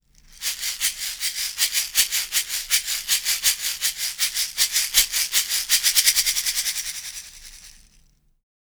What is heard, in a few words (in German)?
shaker; fame pro series vrm-24